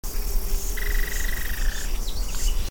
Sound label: Poecilotriccus plumbeiceps